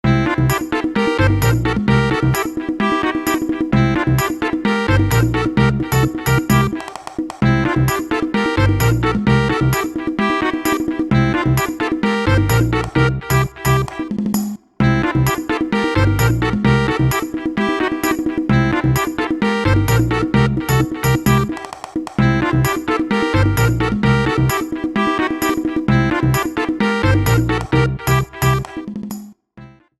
Coaster Hip Hop Mix

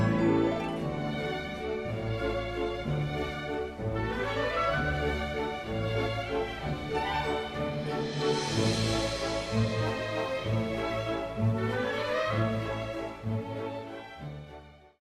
Underwater Theme; SSBM; Rainbow Cruise

